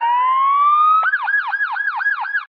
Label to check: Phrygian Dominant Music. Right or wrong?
Wrong